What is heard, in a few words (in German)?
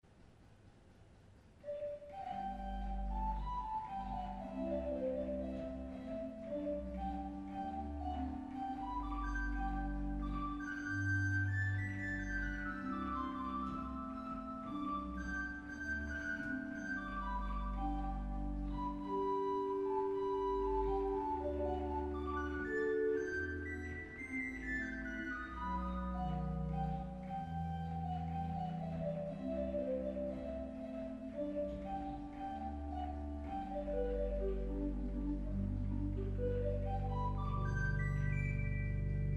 Rohrfloete 4